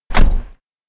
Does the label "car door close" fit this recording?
Yes